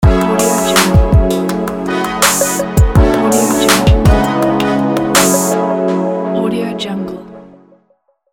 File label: fashion hiphop logo